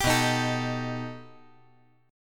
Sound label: B Augmented 7th B down